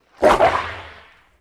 Whip Thick Whoosh; Weapons & Explosions; Sony Pictures Sound Effects Series Volumes 1-10; Sony Creative Software

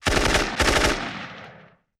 Machine Gun Burst; Weapons & Firearms; Sony Pictures Sound Effects Series Volumes 1-10; Sony Creative Software

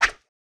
Sword Movement Single; Weapons & Firearms; Sony Pictures Sound Effects Series Volumes 1-10; Sony Creative Software

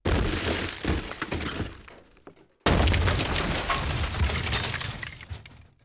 Explosion Debris Sweetener; Weapons & Explosions; Sony Pictures Sound Effects Series Volumes 1-10; Sony Creative Software

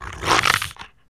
Gorilla Snarl; Animals; Sony Pictures Sound Effects Series Volumes 1-10; Sony Creative Software

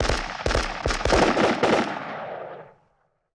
Machine Gun Multiple Short; Weapons & Firearms; Sony Pictures Sound Effects Series Volumes 1-10; Sony Creative Software

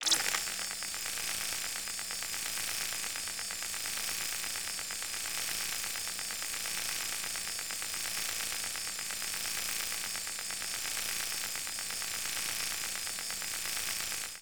Radar Scan; Electronics; Sony Pictures Sound Effects Series Volumes 1-10; Sony Creative Software

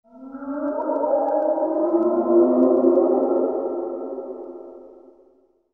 Mythic Howl, Vocals Humanoid; Sony Pictures Sound Effects Series Volumes 1-10; Sony Creative Software

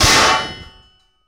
Metal Heavy Impact; Impacts & Destruction Sweeteners; Sony Pictures Sound Effects Series Volumes 1-10; Sony Creative Software

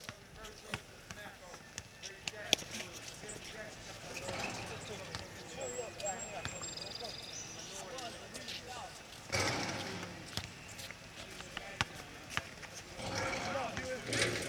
Prison Exterior Basketball Game; Sony Pictures Sound Effects Series Volumes 1-10; Sony Creative Software

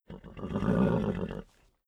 Horse Low Grunt; Animals; Sony Pictures Sound Effects Series Volumes 1-10; Sony Creative Software

